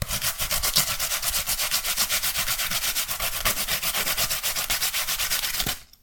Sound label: Japanese radish, cooking